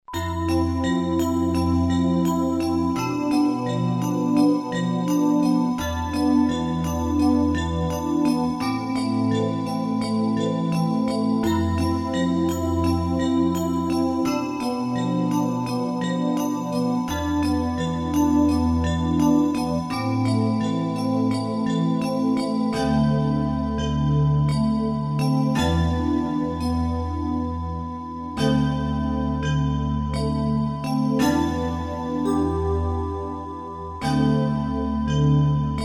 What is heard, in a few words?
MUSIC BOX